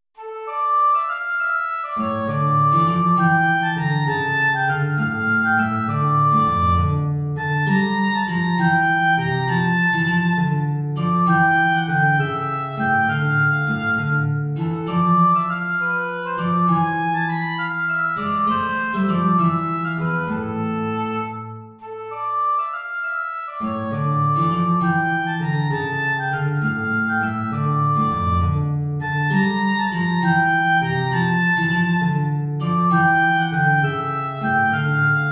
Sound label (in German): Siciliana (Oboe & Gitarre); Opus 4-1-1